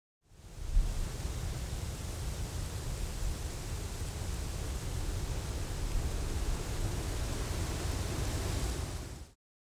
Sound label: wind leaves blow heavy